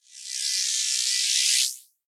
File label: grabbity elec ladder lg; player